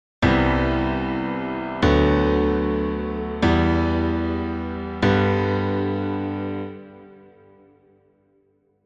piano

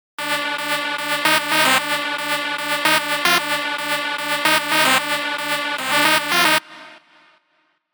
Lead Wet

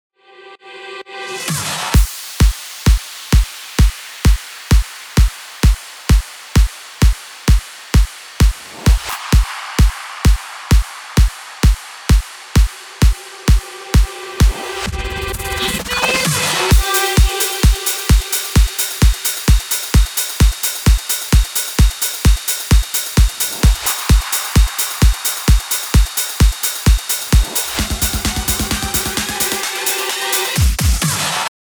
drums vocal FXs

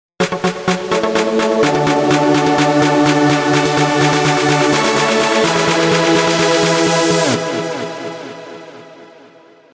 Chord On Cut Off

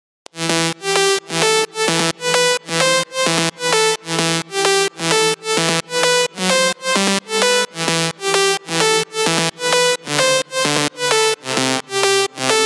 lead filtered

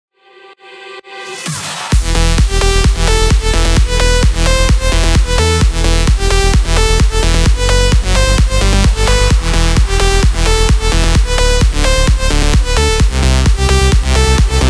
Future Rave Full

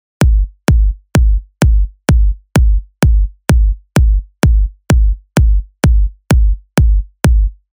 Kick

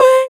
Vocal Shot C Dry

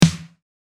Snare Dry

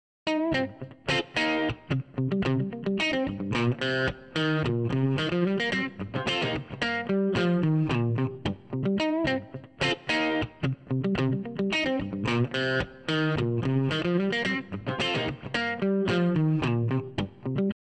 guitar

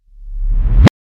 Reverse Kick

